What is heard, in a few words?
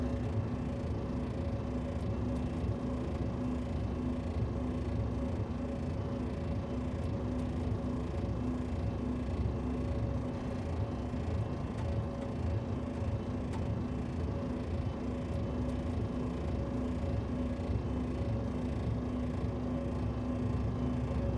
lift up loop, mine